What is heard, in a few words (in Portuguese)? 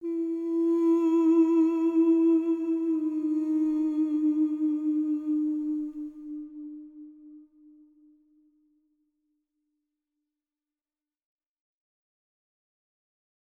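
voz semitom descendente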